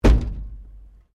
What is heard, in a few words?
WRECK PANEL IMPACT THUMP FIST, Wrecked Cars; impacts, crashes, scrapes; Sound Effects:Objects